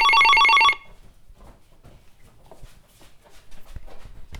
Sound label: phone